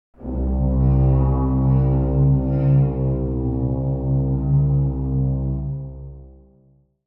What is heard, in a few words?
Cartoon creepy string